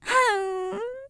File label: voice Cry; Shua